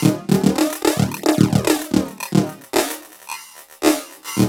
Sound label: LOOP, TECHNO; TECHNO-BOX; ELEC-LOOPS